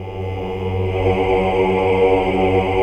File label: AAH; Best Service Gigapack 1